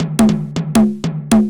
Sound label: TOM 160, Drum Bass; eJay